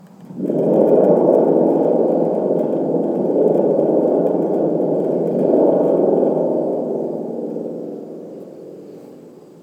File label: thunder gourd